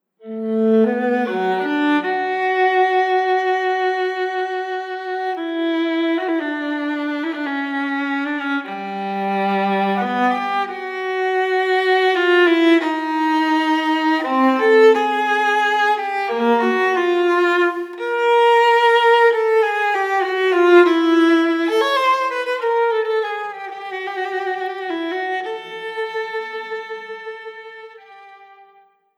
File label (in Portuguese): Viola; Conhecendo Instrumentos Musicais